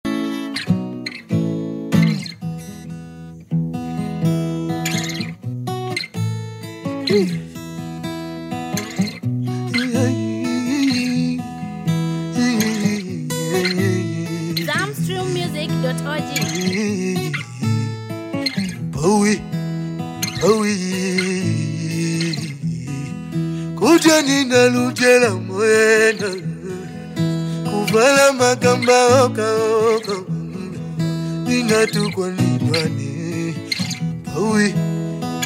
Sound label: Acoustic Version